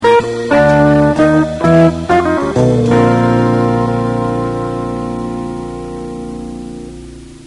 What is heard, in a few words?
blues ending